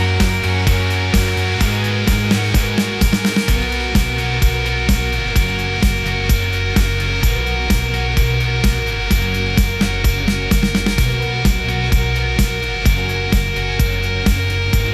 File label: energetic rock